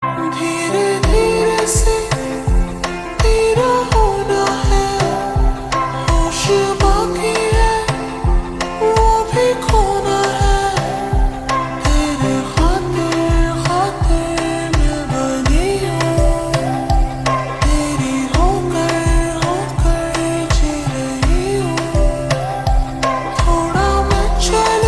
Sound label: Ringtone slowed and reverb